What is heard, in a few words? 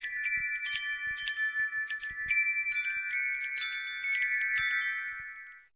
stream